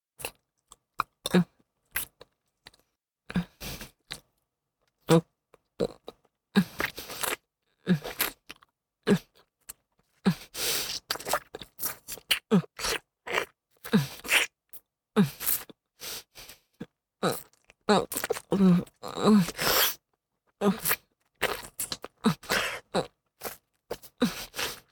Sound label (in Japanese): フェラチオ(中):包皮の剥けた亀頭をしゃぶる, チュパ音, ASMR